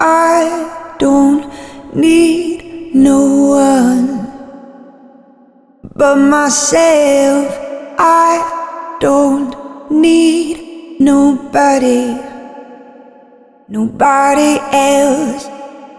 Vocal Loop C m 120

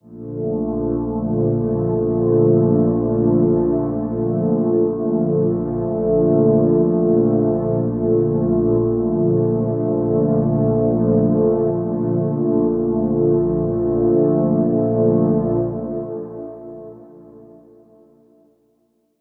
123 A maj7 Synth Loop

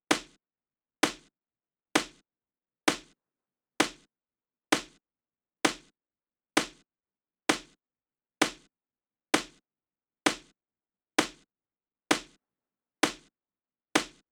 CLAP

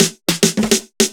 105 BPM Drum Fill

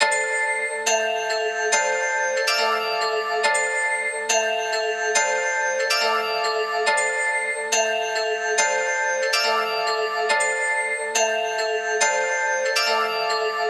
Synth Melody 140bpm D drill dark

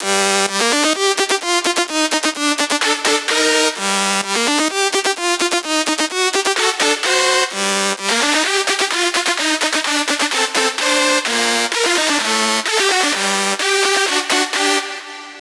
Drop Leads, G, 128bpm, Wet

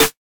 125 BPM Am Snare; Burner

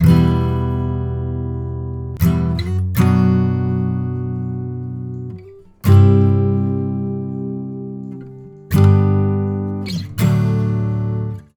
Guitar Loop Emin 83

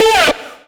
Synth Multi A3

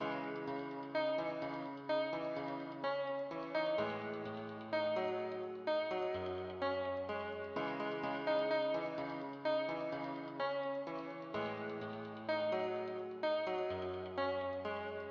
Keys 127bpm

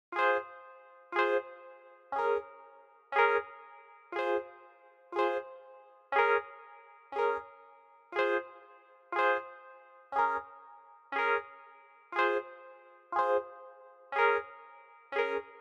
piano

